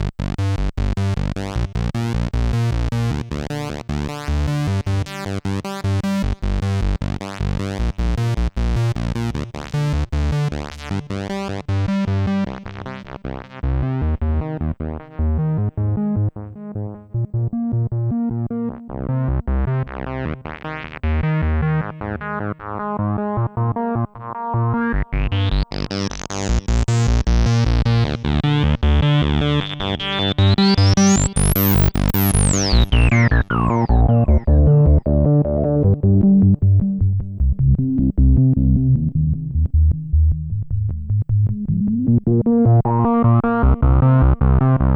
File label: PWM and Analog Lowpass; Groove Synthesis 3rd